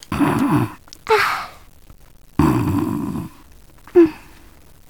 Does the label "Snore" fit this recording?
Yes